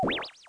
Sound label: Jump